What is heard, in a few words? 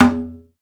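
conga low, Latin; Roland L-CD701; PRC Latin 1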